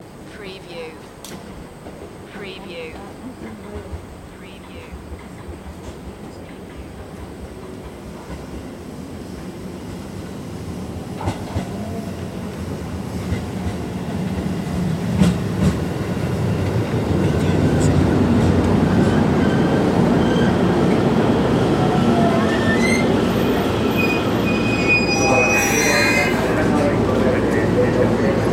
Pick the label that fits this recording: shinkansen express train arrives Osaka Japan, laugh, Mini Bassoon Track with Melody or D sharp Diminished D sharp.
shinkansen express train arrives Osaka Japan